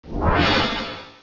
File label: Beak Blast; pokerogue; battle anims